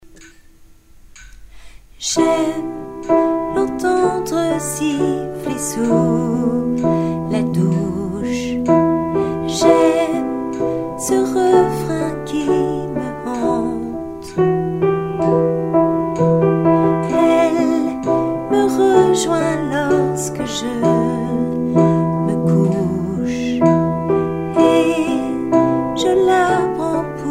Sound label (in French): c'est une chanson alto